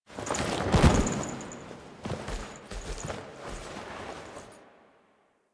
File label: Foley B; Drifter; Warframe; Quests; The New War; Narmer Outpost; Chamber Archon Intro